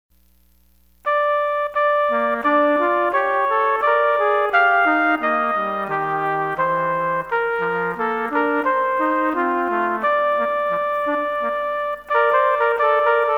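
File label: Bethlehem 2 trumpet; Trpt Duets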